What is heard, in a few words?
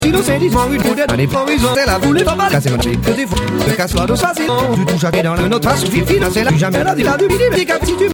loop